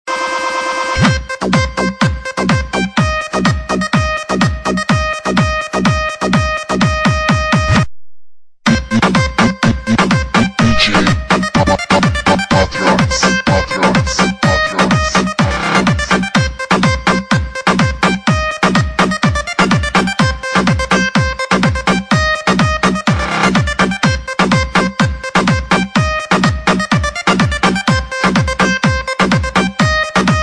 dance

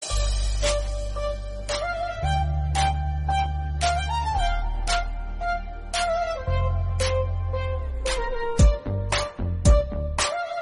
Flute; Mr And Mrs Sadachari

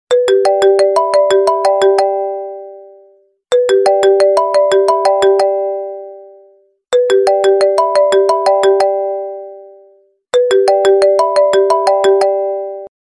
apple iphone

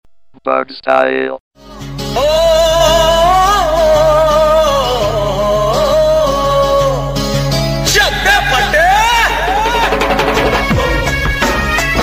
ringtone; namastey london

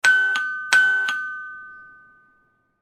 ding dong sound two times

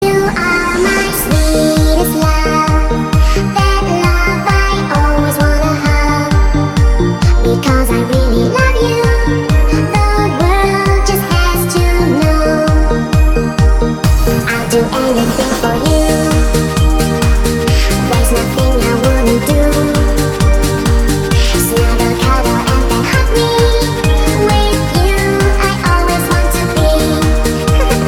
kuschel song dance

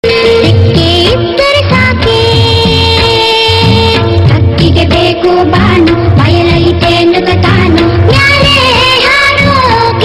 ringtone

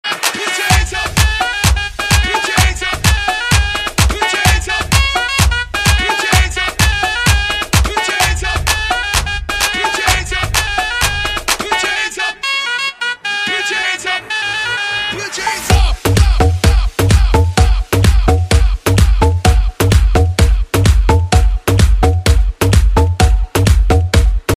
hands up version, ringtone

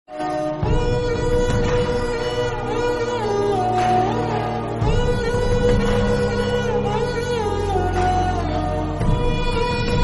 Movie Sad Bgm; Unnadi Okate Zindagi

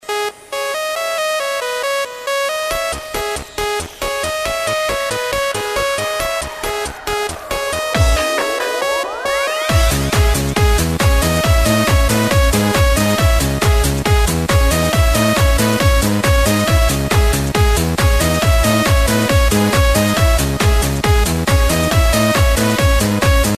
dance

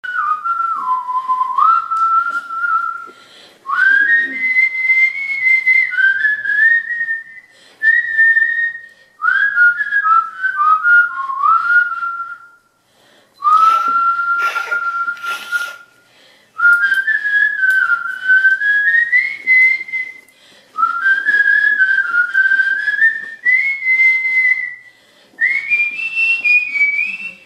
whistle

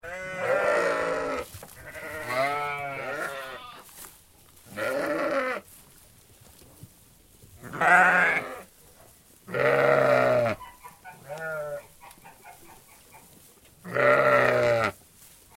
flock of sheep

